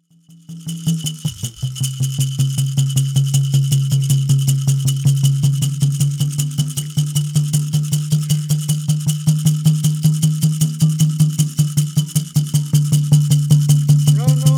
Native American Church Song

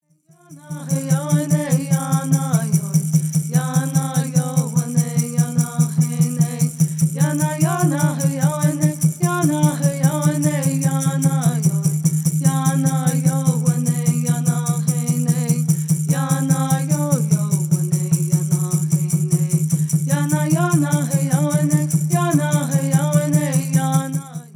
Peyote Song

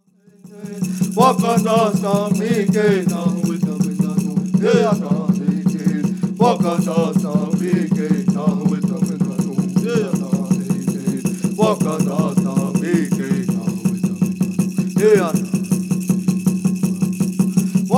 Ceremonial Song

